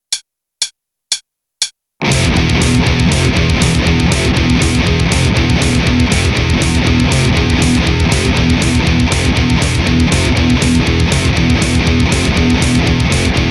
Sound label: heavy, bass; jens goers